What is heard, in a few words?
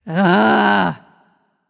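ugh, female voice; smite; voicepack; hera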